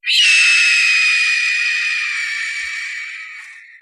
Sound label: Screaming Hawk